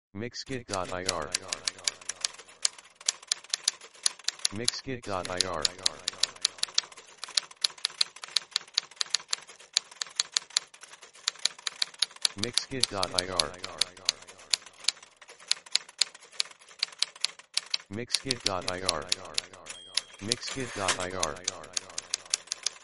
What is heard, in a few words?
typewriter